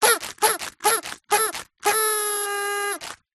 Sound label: party horn blow paper tube roll out; zapsplat; foley